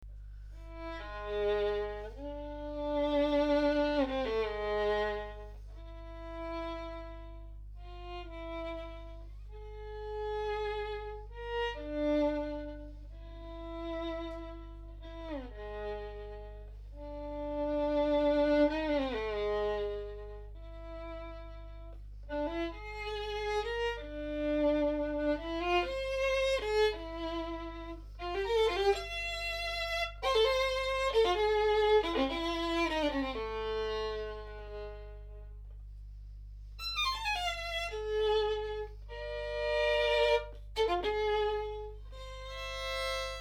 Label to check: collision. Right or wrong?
Wrong